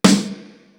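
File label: CANON SNARE; East West; Global Sounds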